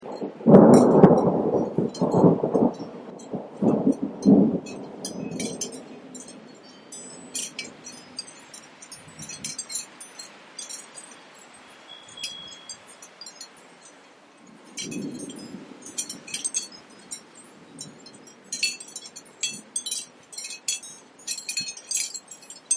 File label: t storm